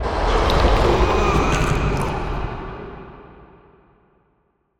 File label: Distant Absctract Scream Short; TheDeclineOfWarriors; Monster Sounds Volume II